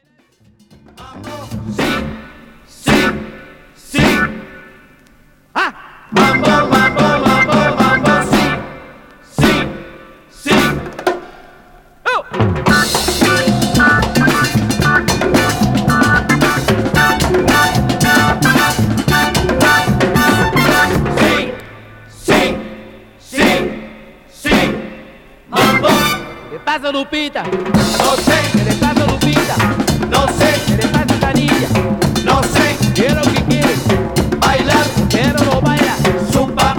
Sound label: Afro Percussion, latin breaks